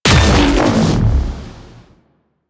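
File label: Stomp Ability; Warframe; Corpus; Ambulas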